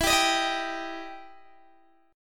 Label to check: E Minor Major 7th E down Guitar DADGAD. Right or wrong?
Right